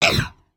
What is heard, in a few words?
VO Walk Run; creatures organic